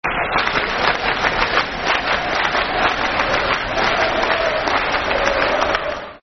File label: Clapping Fanchant, Football Chant; Leeds; fanchants; Leeds Lufc